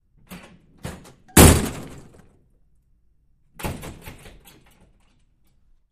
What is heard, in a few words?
Door Push Bar Wood